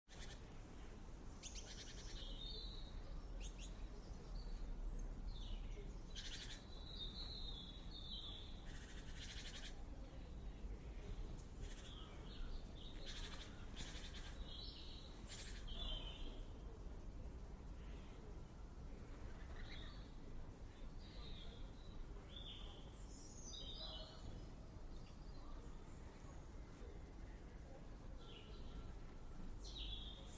afternoon garden birdsong, mainly robins and pigeons, birds, birdsong, stereo